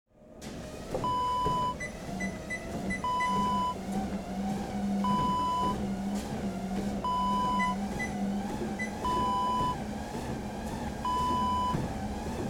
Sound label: treadmill walk